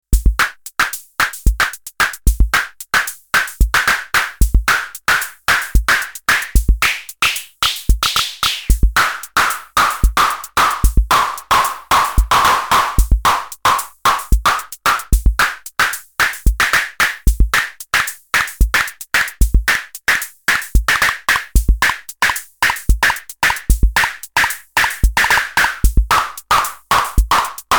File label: AVP MAD-5 Clap